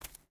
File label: footstep barefoot concrete walk; Rust